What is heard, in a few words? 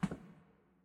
concrete step; Minetest; backrooms